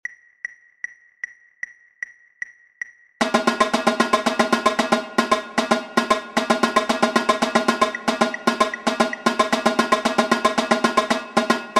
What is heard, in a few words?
timing tenor